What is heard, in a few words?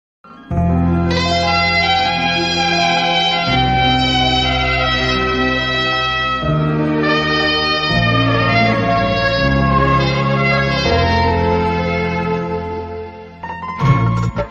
kannada love BGM ringtone; bhoopathi